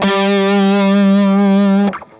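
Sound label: fuzz guitar vibrato; MAYFUZZ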